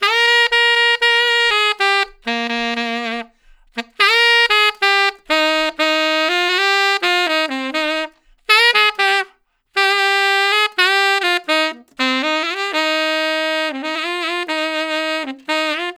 Tenor Solo, Jazz, Traditional, Tenor Sax

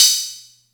Atlantex Music Percussion Computer Cymbal Short

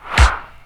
HIPLAY SD, TECHNO; Crypton - DrumBase5000; TECHNO #2

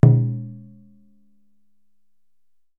DJUN DJUN, African Perc

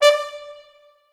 D4 R, Orchestral Brass; Best Service ProSamples vol.20; AKAI

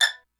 pizz A5, pizz section; Greytsounds VOL-1

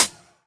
Closed HH

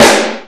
SNARE E3; Greytsounds VOL-1; SDX SNARES

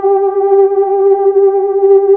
SPACE G3, PAD; S.PAD

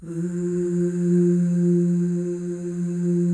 FEM OOS, Symphony of Voices

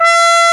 cornet E5; AKAI S-Series CD-ROM Sound Library VOL-2

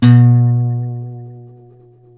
C 3 MARTIN 6, Guitars

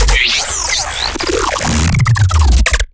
glitch stab; Virtual Riot; Glitch Stabs

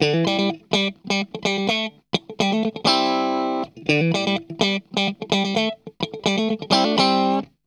STRUT, Funk Guitar; 90 s Sample CDs; Zero G

